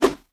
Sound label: zombie shield sword